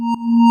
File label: End Call; qTox